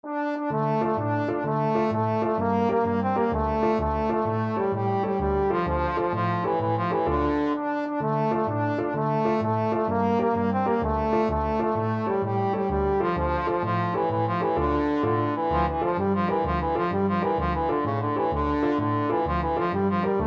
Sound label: tarantella trombone